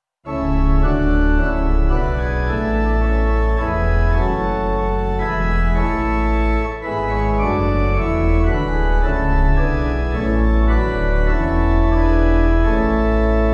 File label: organ 3vs; wareham; Hymnal 1982